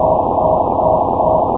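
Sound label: elevator move; video game; source engine; hl1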